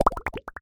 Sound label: robot run bubbles